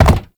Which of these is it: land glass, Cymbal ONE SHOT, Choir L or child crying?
land glass